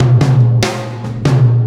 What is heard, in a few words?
Landing Party Beat, Processed Drumkits